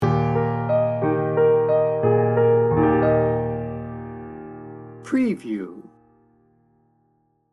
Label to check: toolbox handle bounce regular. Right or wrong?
Wrong